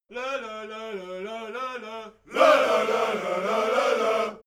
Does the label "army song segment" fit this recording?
Yes